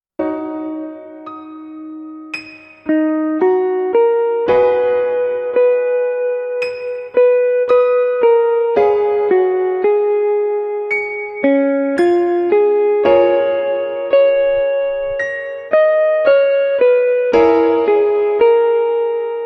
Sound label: Es dur alto